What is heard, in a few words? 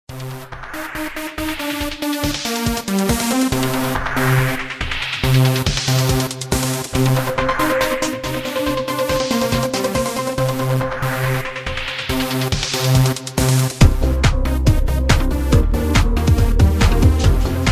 Rhythm, ringtones; Lenovo; A630